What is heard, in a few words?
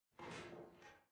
Metal Creak